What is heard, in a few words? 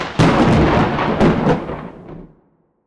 Elemental Thunder